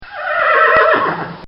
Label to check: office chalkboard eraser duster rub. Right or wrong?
Wrong